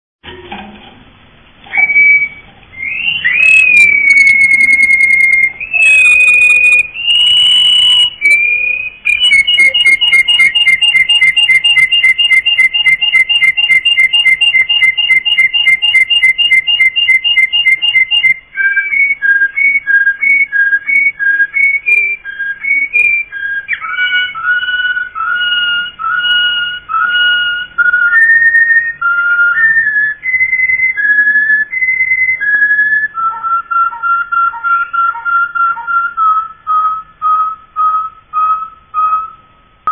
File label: russian canary